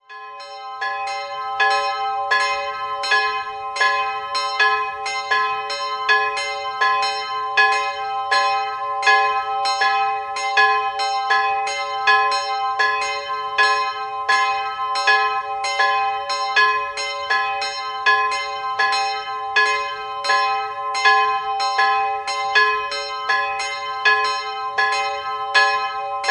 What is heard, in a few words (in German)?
Meihern, Glocken